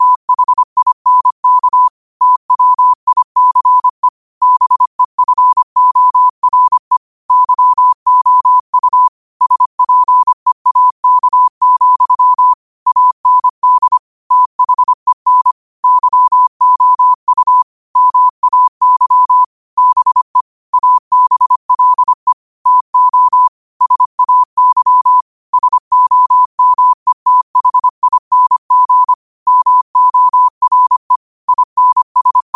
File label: 5 wpm, morse